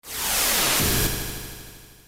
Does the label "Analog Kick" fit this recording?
No